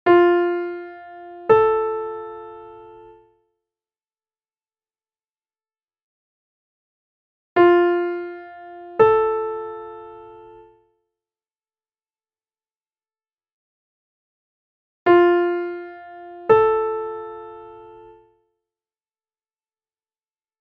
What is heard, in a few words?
Intervals; Practice Exam Example 3